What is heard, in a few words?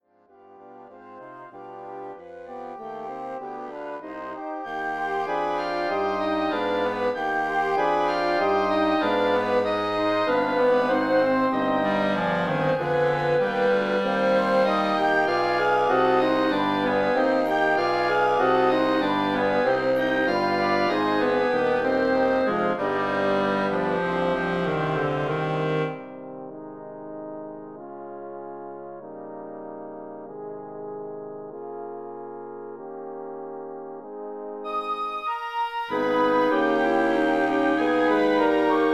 Organ